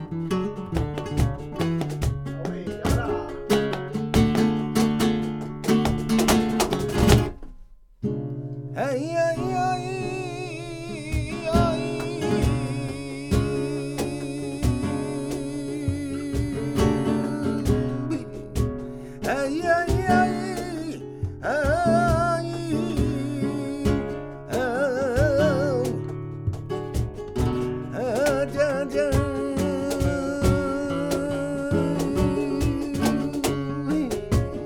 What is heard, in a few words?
Solea Rough